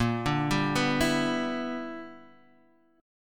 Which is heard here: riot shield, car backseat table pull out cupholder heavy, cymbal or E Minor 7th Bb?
E Minor 7th Bb